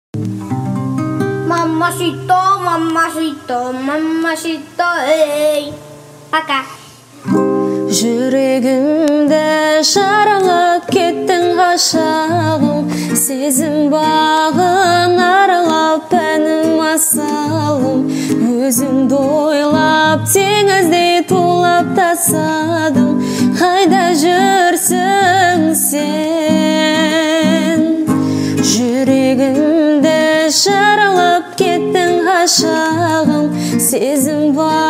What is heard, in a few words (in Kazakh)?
gitara